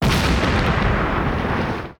explosion

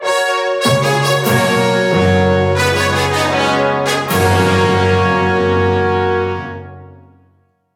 Fanfare BPM100 Gb

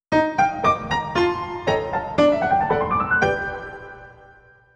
Jingle Piano; BPM116, 4/4, G, Piano; Piano 3

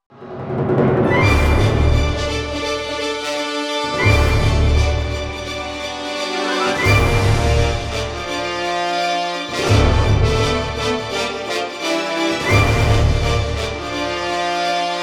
Battle Dm; Orchestra

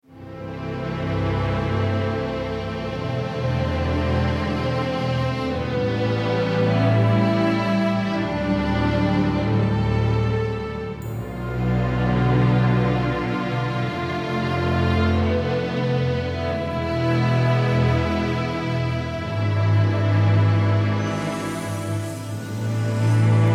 Field BPM90 C

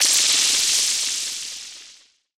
chimaira

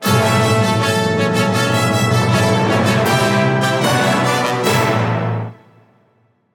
Fanfare BPM90 A; 4/4; Brass + Percussion

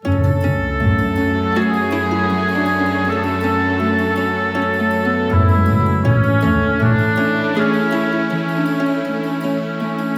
Game Menu BPM80 Gbm Woodwinds; 4/4; F#m; Woodwinds + Harp

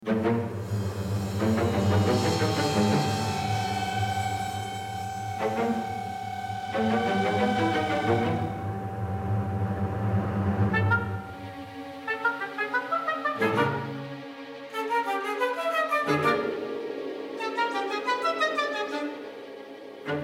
Dungeon BPM90 Gm, Free Music

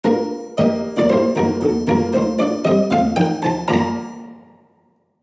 Jingle Pizzicato; BPM116, 4/4, Bb, Pizzicato + Triangle

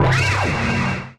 cyclops

